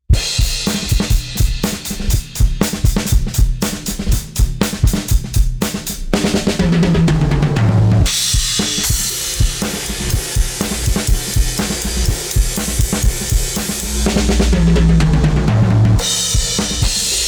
Drum Bus Parallel Groove; Unism

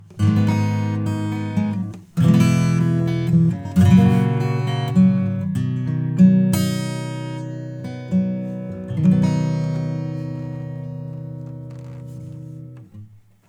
Acoustic Guitar; Neutron 4

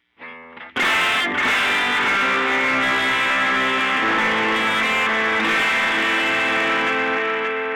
tube delay wet time right feedback long

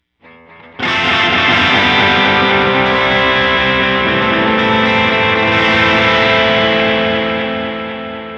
electric; Cluster Delay

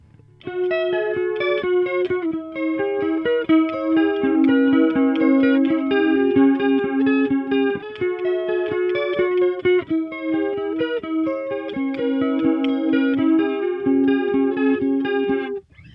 guitar chorus

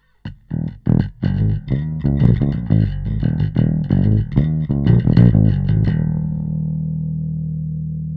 bass; Nanopulse; Live

